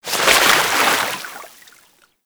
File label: Generic Roll Water; Warframe; Characters